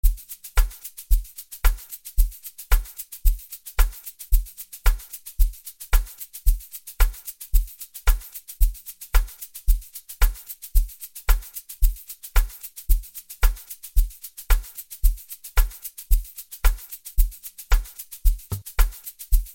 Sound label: Techno Private Tech Piano